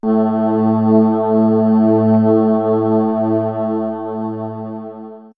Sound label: Swirls Ambient Drone, pads; X-Static Goldmine